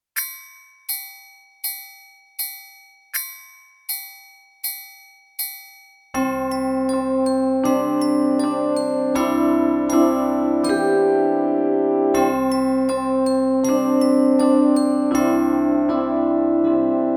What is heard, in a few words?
ring those bells